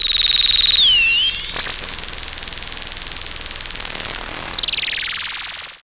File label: FX SWEEP, SYNTHSWEEPS